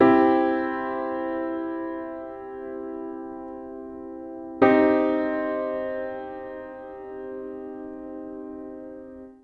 major and minor triad

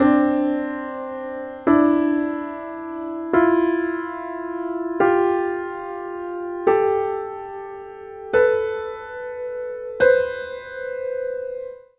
basic seconds

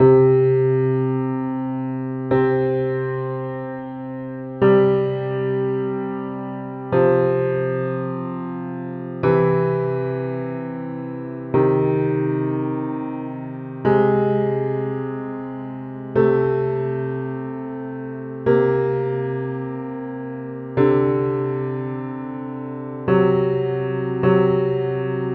basic intervals from consonance to dissonance

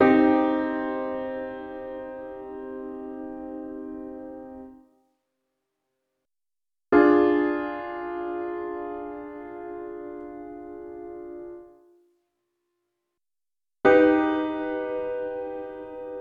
basic degrees in minor

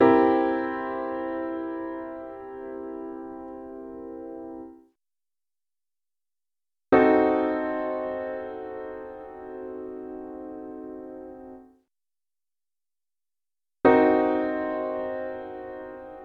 basic seventh chords

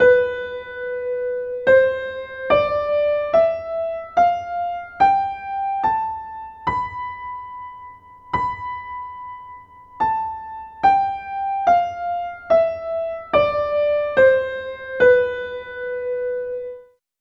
locrian

